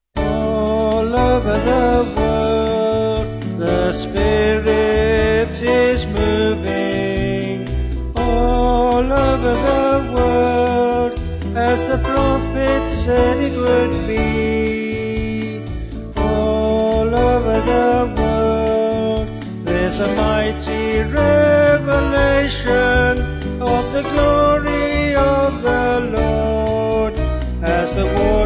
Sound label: Vocals Band